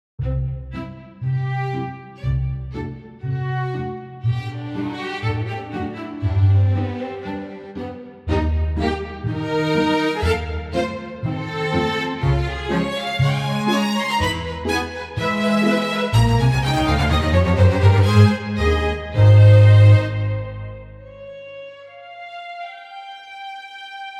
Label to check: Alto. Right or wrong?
Wrong